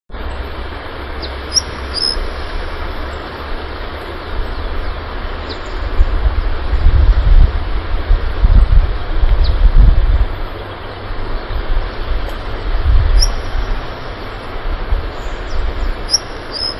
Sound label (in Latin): Knipolegus aterrimus; PP Cumbres Calchaquies